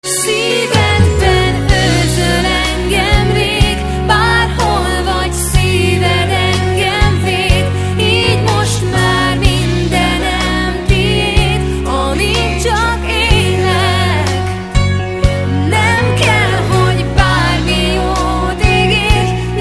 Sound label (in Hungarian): csengőhang; magyar pop